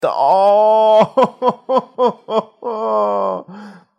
nah ha ha ha

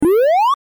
jumpy; sonikku

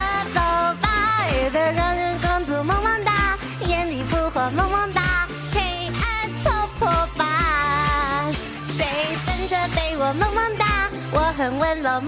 fighting baby

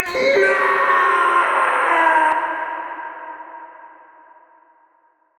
scream; angry birds

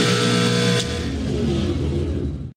red buzzer; bgt

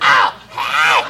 aaah

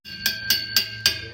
bell ringing to open door

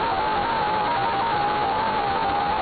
jumpscare sound; five nights at ferrets 4

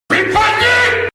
sound alert

